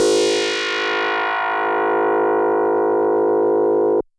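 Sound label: DX100 G#0; rocket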